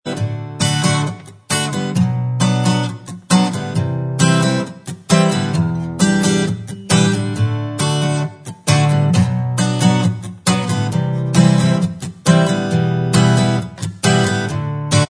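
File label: acoustic instrumental, backing track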